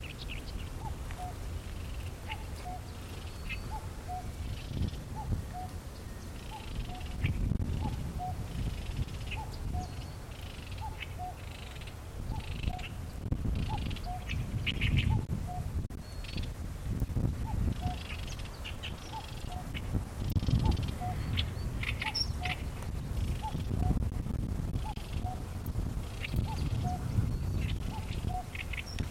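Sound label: bird, Common cuckoo; kakkou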